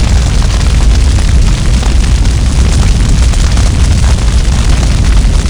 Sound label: fire huge